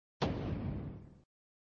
Sound Ball lock sound effect, flipper; Ball lock sound effect v3

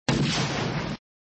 Sound Gun sound; Gun sound v4; flipper

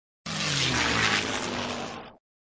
Sound Plane flight; Ramps

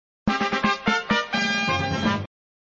Sound Fanfare with ending tune, flipper

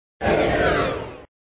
Sound SFX, flipper

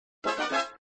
Fanfare; flipper

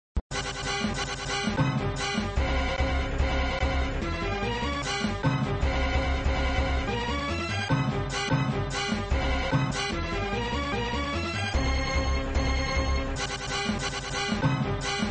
Music Hurry up music (looping), flipper

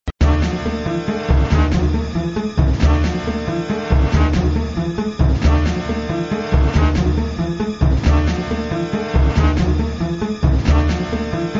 Music, flipper; sttng

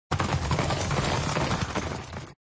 Sound Horse ride, flipper; Get the tank loop; Indy

